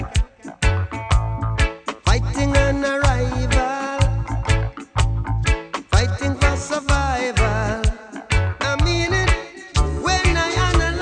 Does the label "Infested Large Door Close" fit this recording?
No